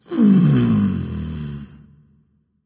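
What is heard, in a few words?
nonverbal content; smite; voicepack; ullr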